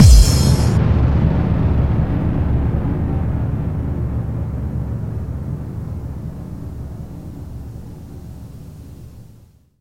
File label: FX Boom